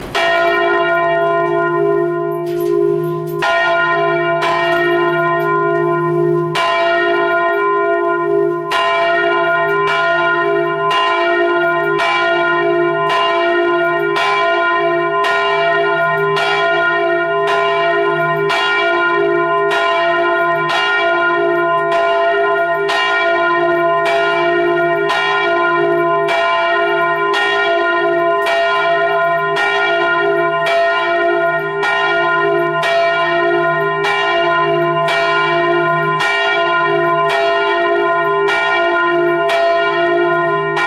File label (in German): Kirche Glocke f1; St Lamberti; Hl Kreuz